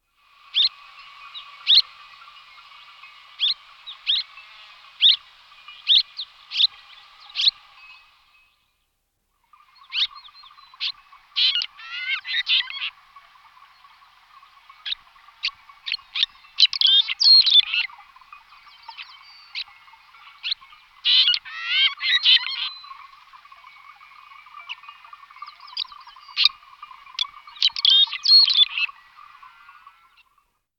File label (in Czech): Vlastovka skalni Cecropis daurica